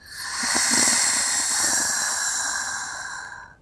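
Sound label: female zombie; Project Zomboid v41.73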